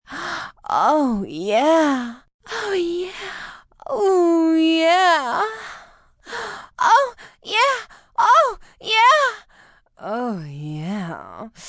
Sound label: Sex Oo Yeah F, Sex, female, multiple sexy